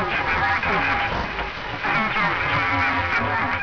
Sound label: signal, binoculars; hl2